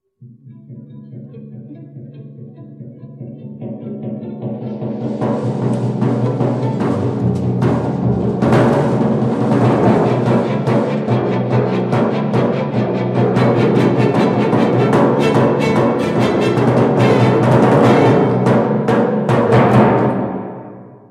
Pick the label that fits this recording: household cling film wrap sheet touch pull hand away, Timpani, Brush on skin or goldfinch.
Timpani